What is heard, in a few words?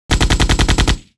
auto gun